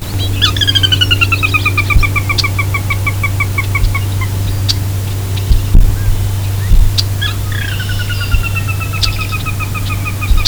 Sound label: whinny, Sora; National Marsh Bird